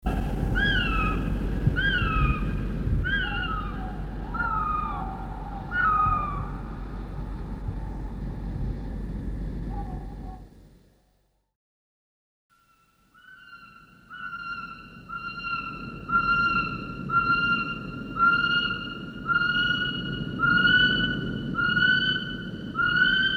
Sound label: Kiwi male, Bird Calls